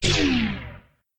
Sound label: throwing build arrow; minetest; throwing arrows